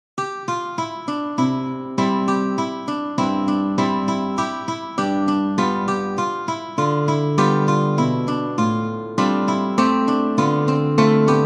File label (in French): valsette duo